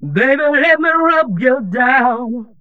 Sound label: Funky Vocals; USB Soundscan vol.18; AKAI